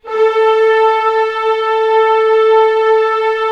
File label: ORC A3 FF, Orchestra; Sound On Sound - Peter Siedleczek's Orchestra